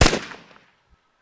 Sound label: pp2000 shoot, weapon